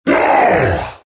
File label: morph pain; terrorblade